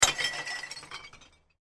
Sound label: Bottle Smash Slightly; Smashes; Objects; CraftedSignals